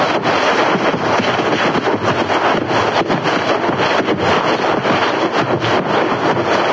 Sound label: body tumble; There; ThereClient